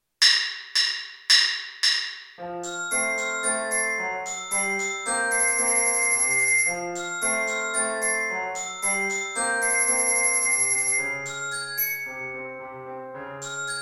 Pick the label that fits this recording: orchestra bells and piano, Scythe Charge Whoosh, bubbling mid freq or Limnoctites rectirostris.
orchestra bells and piano